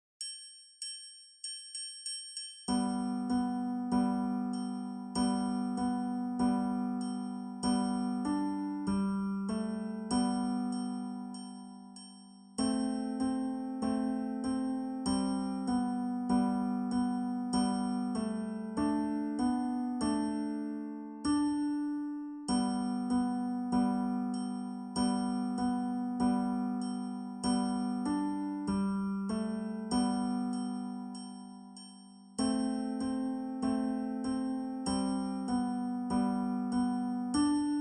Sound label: jingle